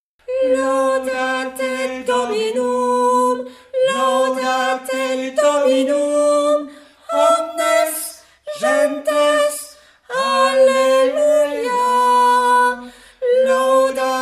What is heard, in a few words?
Laudate Dominum, tenor